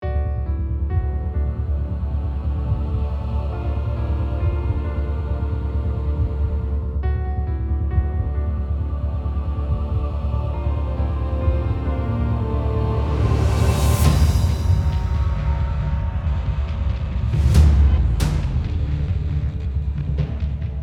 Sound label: Trailer; Pulp Music Co